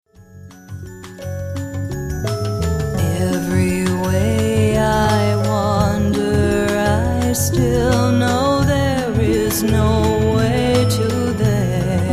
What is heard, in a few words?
Singing